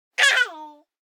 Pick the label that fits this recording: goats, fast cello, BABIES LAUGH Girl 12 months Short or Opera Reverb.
BABIES LAUGH Girl 12 months Short